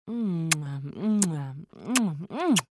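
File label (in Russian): zvuk vlyublennyh pocelui zhenshiny, sound of lovers kissing, woman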